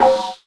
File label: FX Dance